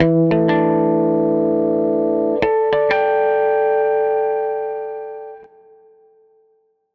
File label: SG Guitar Loop